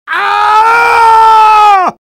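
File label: spy pain critical death; tfc; svencoop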